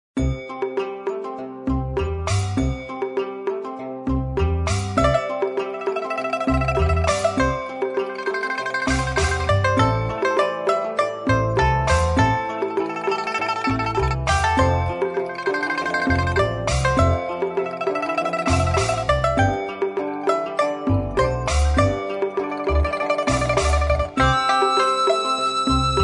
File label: music